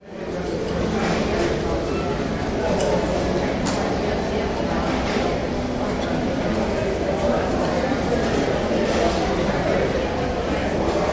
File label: food Court lodge, Yosemite; Diners; Spring trip to Yosemite Valley